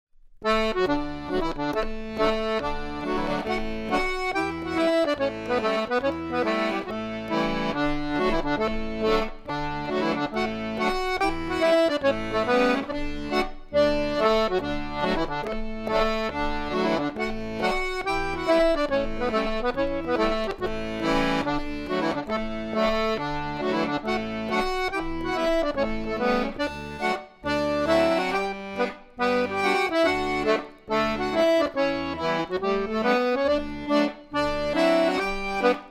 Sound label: Norwegian Hornpipe, Folk Orchestra